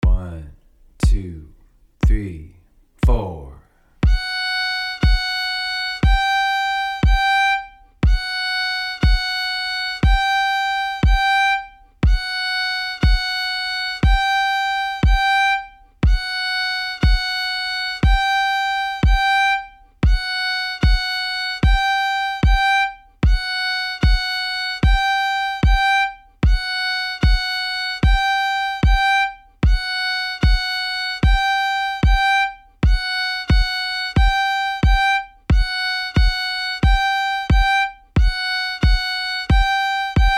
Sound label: Low 2nd exercises, fiddle; old joe clark basic version; high and low second finger